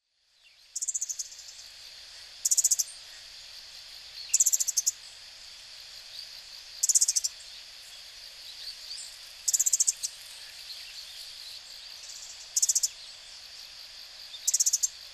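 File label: Asian Brown Flycatcher; koe; hyogo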